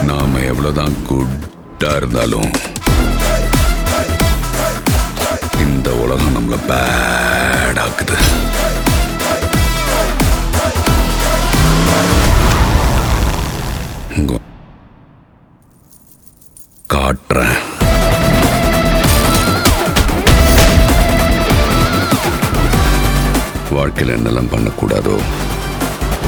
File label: BGM